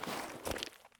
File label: prone gravel; Escape from Tarkov